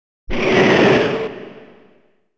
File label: Tornado firing sound; 3 area boss